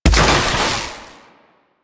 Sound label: Core Electrical Explosion; Warframe; Ambience; Corpus Gas City; Gameplay Remaster